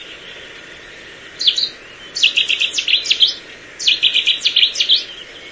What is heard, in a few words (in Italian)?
Usigniolo di Fiume